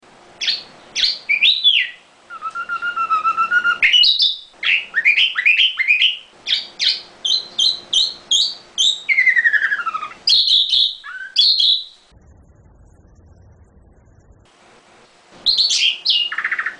kicauan nightingale variasi

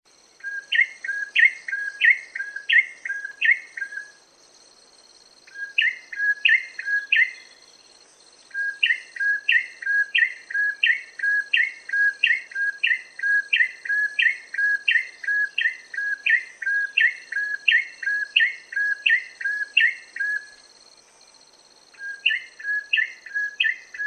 suara cinenen merah

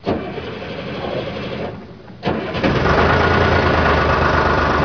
suara mobil

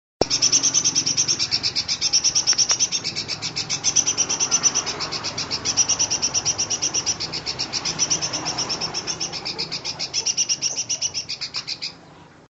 suara burung cucak rante ngekek

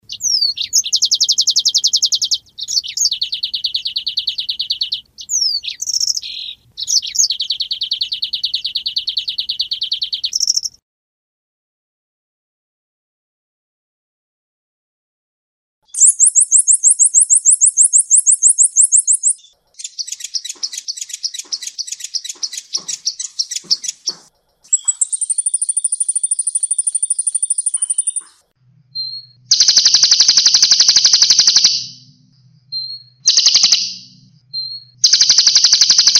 kombinasi manyar kolibri

